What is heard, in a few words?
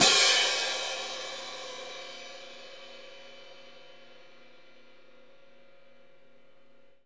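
ORCH, CONCERT CYMBAL; Northstar - New Gold VOL-2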